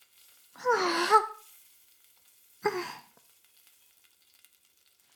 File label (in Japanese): フェラ音声; 古き城のドラゴニート2